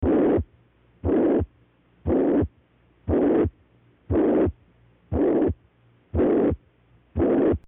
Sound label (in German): Mitralinsuffizienz